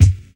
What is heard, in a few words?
thick bass drum sound F sharp key; kick drum samples